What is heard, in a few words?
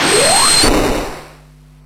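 Battle Skill; Etrian Odyssey III HD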